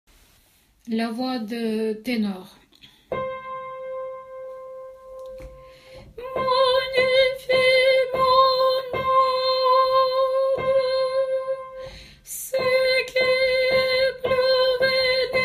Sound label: tenor; Noel Ode9